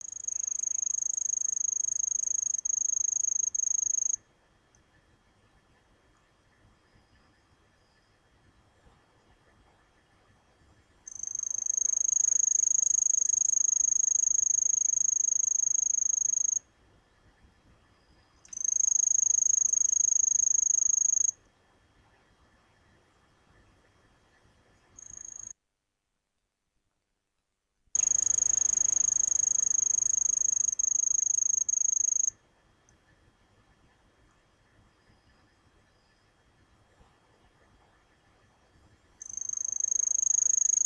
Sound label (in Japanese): kusahibari